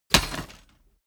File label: drop metal and wood rod hard